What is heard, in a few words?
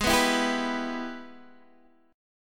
Minor Major 7th Sharp 5th, Guitar, Open D; Gsharp; x,11,9,10,10,9